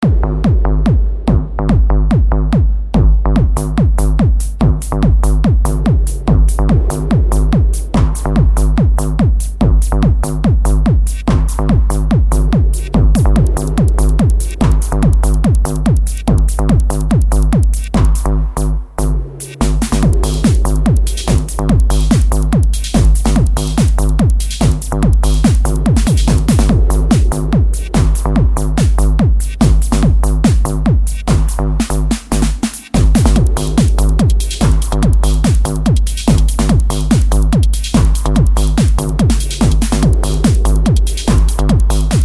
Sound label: e phonic drumatic 2 vsti pure external fx